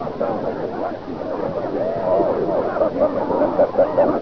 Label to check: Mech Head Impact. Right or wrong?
Wrong